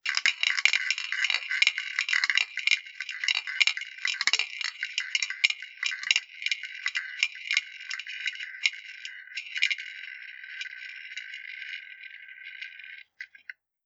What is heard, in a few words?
spin